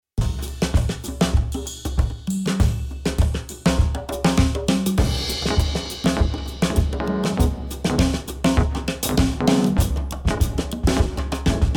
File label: Parallel Distortion Drums Piano Processed